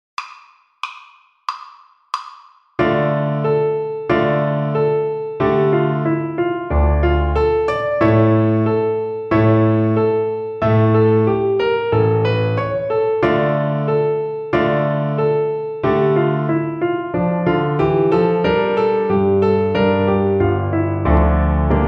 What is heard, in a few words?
String Gym, Piano Accompaniments